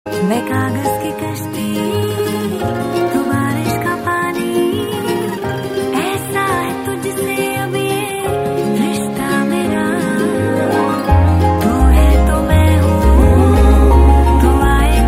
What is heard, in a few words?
Ringtone Female